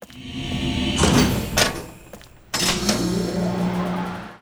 Elev Down Top Bottom; moul-assets

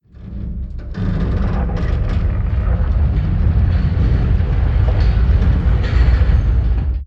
Grinder Wheels Start; moul

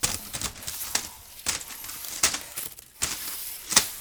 Dirt Slide Lp

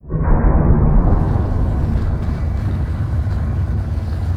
Timer Start

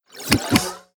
Enable Button

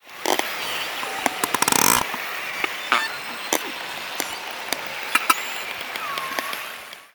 Creature Button

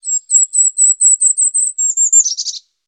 Bird Call

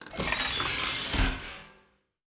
Shades Open

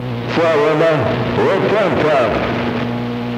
Escape The Flame

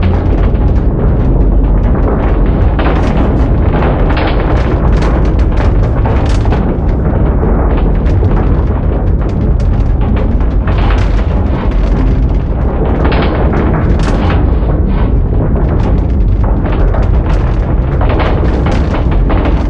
Harvester Clatter Loop; moul

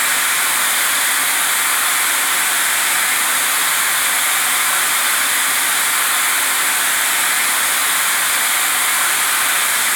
Steam Release

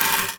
Plank Stn Slide No Lp

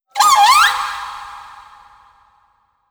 Critter Female Verb; moul-assets; Kahlo

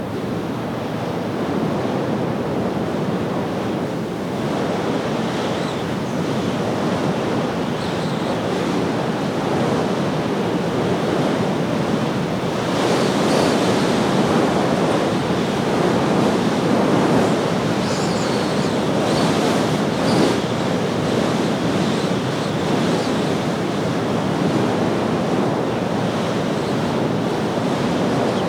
Wind Night Loop

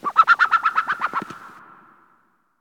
Lagoon Rand Bird

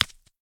Rock Dirt Hit; moul-assets

